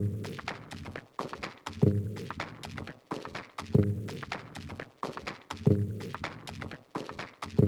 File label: Drum Loops 125bpm